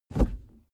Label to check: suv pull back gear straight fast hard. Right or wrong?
Right